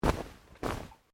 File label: Clothing Shirt Cotton Move Quiet; Movemement sounds for various articles of clothing; Dressing